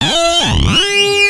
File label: cs1x crazy